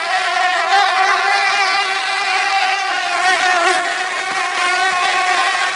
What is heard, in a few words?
loop flies